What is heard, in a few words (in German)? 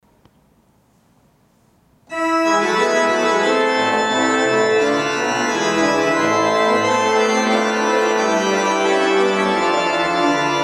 Breil, gebrauchtorgel